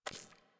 UI Corpus Theme Select Confirm; DE; Warframe

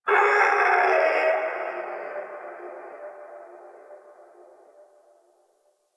Distant Zone Four Creature; Ambience; One Offs; Warframe; Eidolon Plains

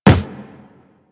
Run Wood, Footsteps; Warframe; Operator

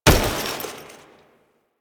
Foley Weapon Fire; Warframe; Kahl

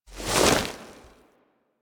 Lean Foley; Cinematics; Shrine Intro; Ballas; Warframe; Sacrifice

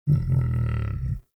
Battle Dog Relax; Animals; Vocals

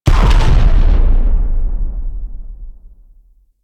Enemy Ship Destroyed Explosion; Rail Jack

